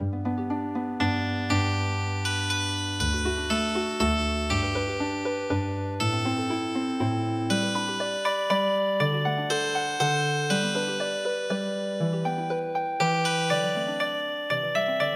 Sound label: Healing Harp, traditional; Durchs Wiesetal Gang I Jetz Na